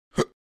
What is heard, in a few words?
Middle aged man hiccup